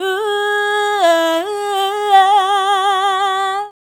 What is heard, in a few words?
Female Vocal